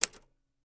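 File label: Typewriter Single Key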